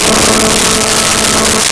Viola A3; Nintendo; July 2020 Gigaleak

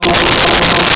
Choir Ahhs F3; Nintendo; July 2020 Gigaleak

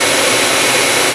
plane; Nintendo; July 2020 Gigaleak

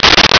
Slapshot; Nintendo; July 2020 Gigaleak

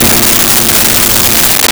GM Piano Bb2; Nintendo; July 2020 Gigaleak

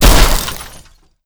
jeep crash hard

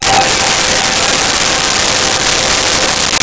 Goblins C5; Nintendo; July 2020 Gigaleak

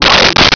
rhodes

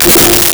Synth Voice F4; Nintendo; July 2020 Gigaleak

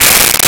Perc FX; BGM; Nintendo; July 2020 Gigaleak; DrMarioSound

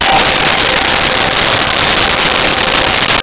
Goblins C5; Nintendo; July 2020 Gigaleak

